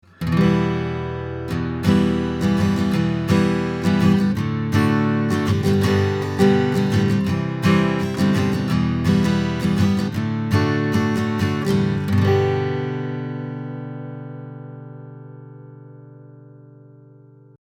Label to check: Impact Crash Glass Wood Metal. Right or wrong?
Wrong